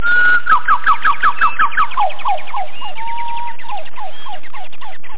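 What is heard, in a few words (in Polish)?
dzungla, jungle; jurajski sen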